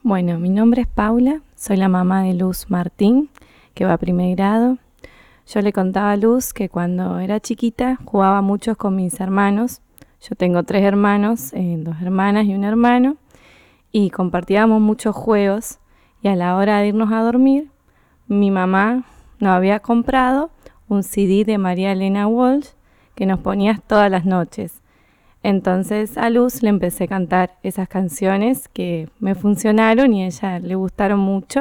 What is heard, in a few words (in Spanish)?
canciones de cuna